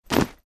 Clothes dropped on the floor

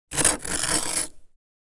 Ice scraping frozen ice block

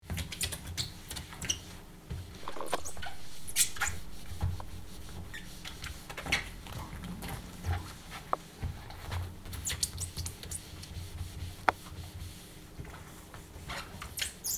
Dish towel cleaning wooden dining room table; household

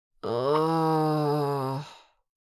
Adult female illness moan

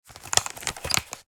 Headphones handling putting on; headphones and speakers

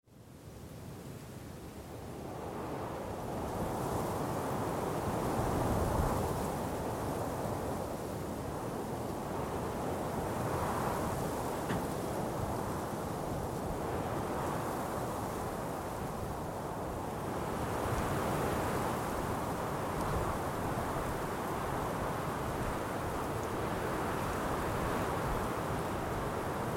Mountain peak ambience light wind blowing

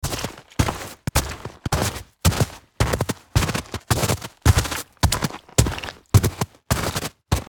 Footsteps on snow boots walking

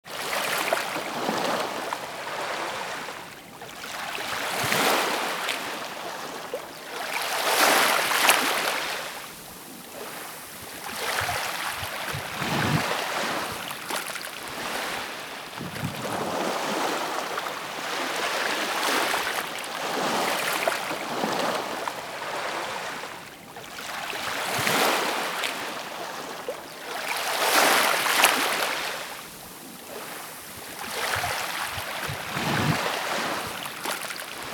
Beach ambience quiet waves water lapping

